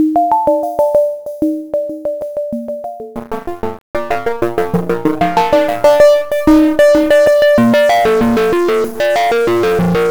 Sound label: arp; diodine